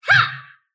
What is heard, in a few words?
sylph attack short; dota; dark willow